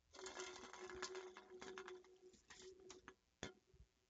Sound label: can Roll; GameplaySequenceDemo